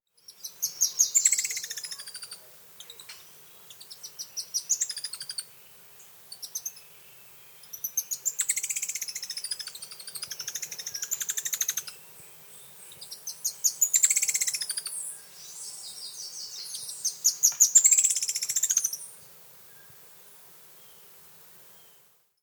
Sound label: Song, Birds of the Costa Rican Cloud Forest; N. America